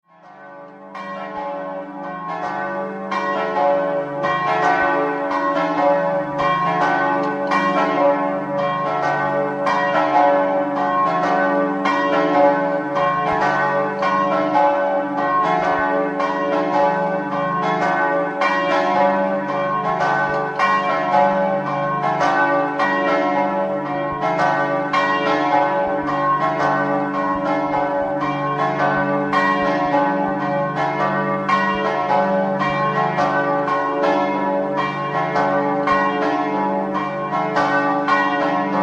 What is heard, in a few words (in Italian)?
MERSINO BASSO, campane